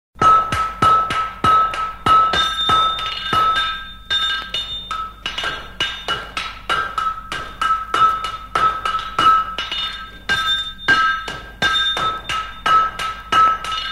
Epaggelmata Sideradiko

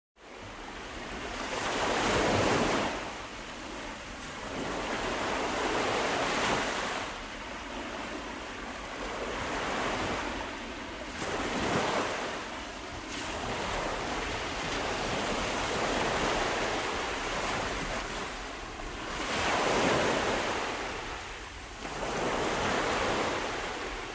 Kymata Thalassas

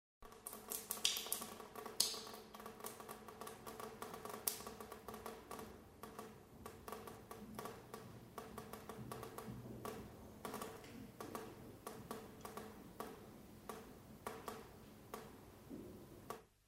Perivallon Stagones Poy Stazoyn

